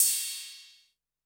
Electro Kit Cymbal

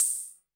Op Hat

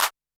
Electro Kit Clap

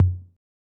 Electro Kit Tom

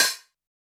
Elec Hf Hat

